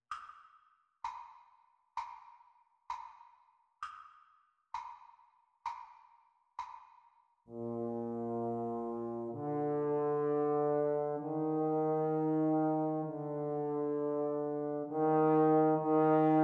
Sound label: playalong for trombone practice speed